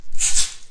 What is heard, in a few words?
rainstick shaker